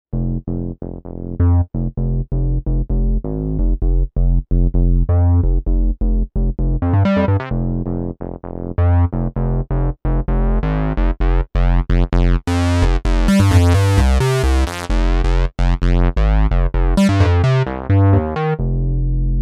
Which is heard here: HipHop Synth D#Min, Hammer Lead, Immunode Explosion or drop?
Hammer Lead